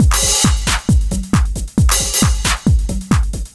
Drums Techno

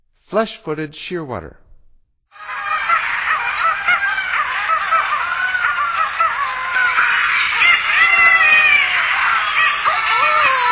Flesh footed Shearwater; North America; Stokes

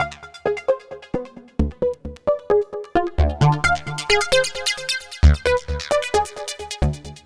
Lead Synth

